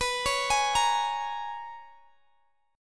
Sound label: Bb Minor 7th B; Bbm7/B arpeggiated